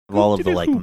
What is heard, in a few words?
making funny sounds